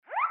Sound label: pesound send, mahjong